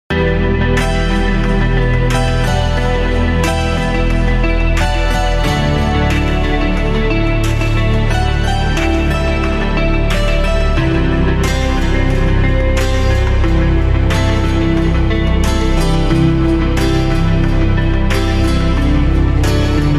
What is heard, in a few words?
Detective Theme